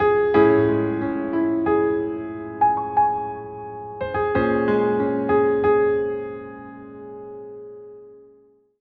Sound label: sad piano music